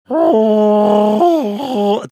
attempting chewie impression

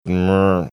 eye widening sound

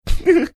funny laugh

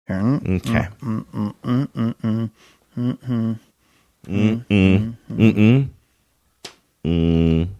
making lot of mmm sounds

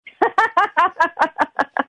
laughing

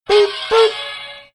singing boop boop

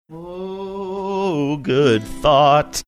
singing oh good thought

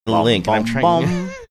singing first few notes

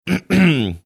throat clearing

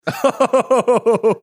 chuckling

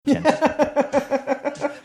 laughing

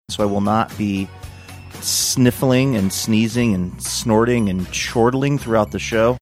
sniffling sneezing

